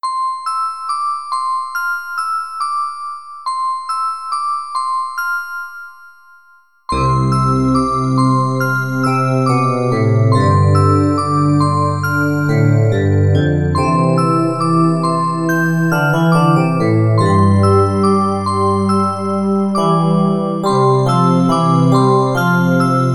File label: lullaby